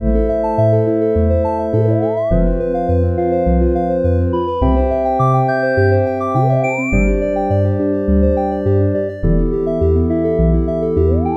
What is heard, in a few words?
cyber loop